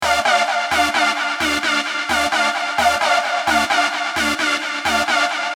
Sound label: 174 synthloop, techy and aggressive; Fitting the purpose of complementing the Bass & Drum Loops, these sounds are predominantly very techy and aggressive; Tech Flavours; Drum & Bass Sample Pack inspired by the sub-genre of Tech Step